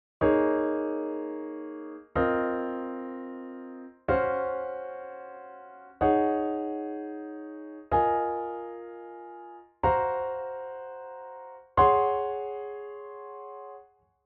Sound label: Quartal Harmony